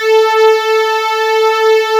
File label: saw chorus; lange-nacht-2009